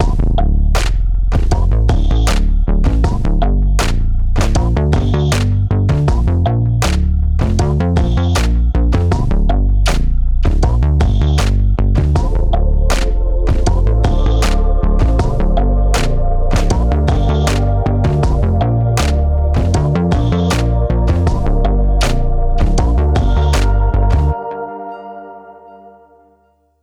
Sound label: Bass